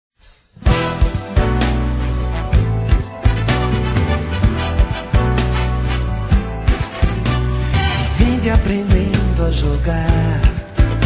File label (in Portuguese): musica fundo; matematica